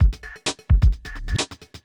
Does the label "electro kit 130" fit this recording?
Yes